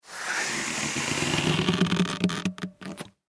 Foley Balloon Blow Up Short; Balloons; Cartoons

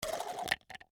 Golf Ball Hole, Sports, Golf, Ball, Into, Hole; Put, Put, Spin; Golf (Sound Effects:Sports)

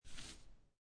Poker Chips Slide One Chip On Felt; Gambling; Casinos

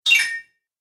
Message Alert, beep

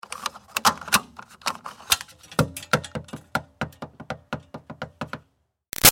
Car Highlander Gas Lid Remove Moves; 2005 Toyota Highlander V6 , Gas Lid , Remove , Moves , Tighten; Cars; Vehicles

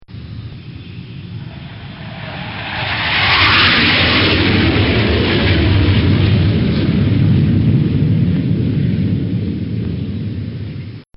Fa18 Hornet Fly By; Bombs; Explosions

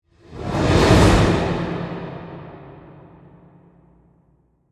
Whoosh Cymbal, Reverb, Transition; Whoosh, Cymbal, Reverb, Production Element, Imaging Element, Accent; Whoosh Sound Effects; BlastWave

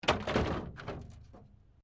WRECK PLASTIC METAL IMPACT, Wrecked Cars; impacts, crashes, scrapes; Objects

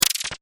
multimedia max min interface sound spinning min; Multimedia Interface ' Minimise ' Sound ( Spinning Style Tone ) Ideal For Minimising Of Br; Tones (Sound Effects:Sound Design); McKinney Sound

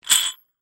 Handle Screw Turn, Handle, Screw, Turn, Metal; Foley; Hand Tools (Sound Effects:Tools)

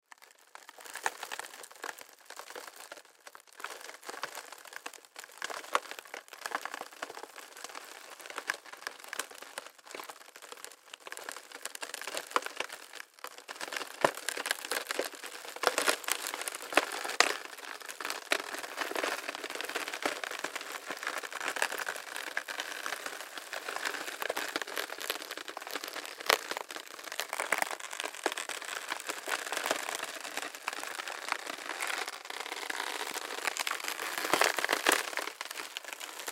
Footsteps Step Debris Glass Crackle Walk Around; Foley; Blastwave

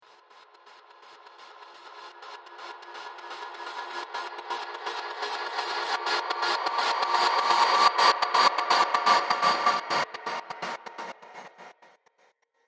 Sci Fi Pad; Science Fiction Sound Effects; star wars / trek style games

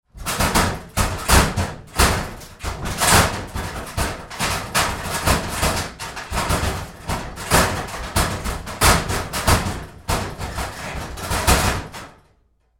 Door Locker Handling Moves Roomy Hard, Metal Door; Doors; Big Room Sound

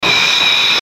static bright; Static Bright Warble Burst; Production Element Imaging Element Accent Transition; Blastwave

